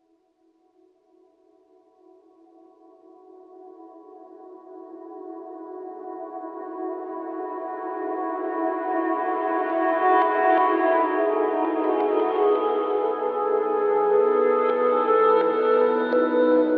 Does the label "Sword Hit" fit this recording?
No